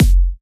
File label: Kick, Kicks; FLPRO